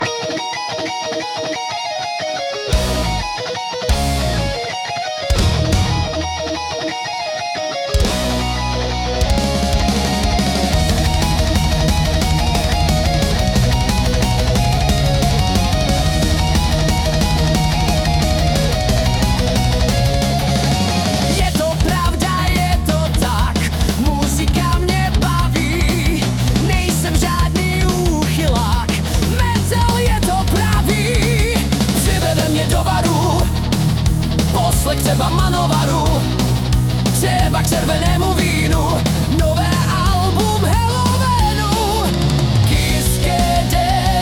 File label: Metal